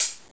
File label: hh pedal